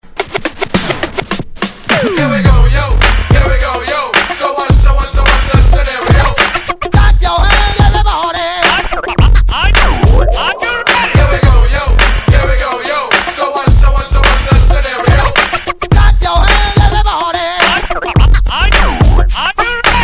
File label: hip pop DJ; 韩国HIPOP舞曲 DJ舞曲